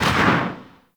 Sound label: Void Dragon Orb Charge Explosion; Enemies; Abilities; Warframe; Duviri